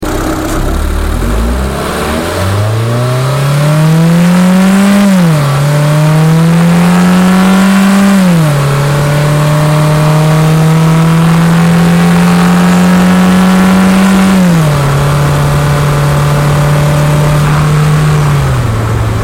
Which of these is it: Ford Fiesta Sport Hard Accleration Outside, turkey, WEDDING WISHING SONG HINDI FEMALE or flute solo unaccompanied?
Ford Fiesta Sport Hard Accleration Outside